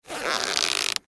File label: Foley Rubber Glove, Scifi, Rubber, Glove, Stretch, Squeak; Science, Fiction; Tech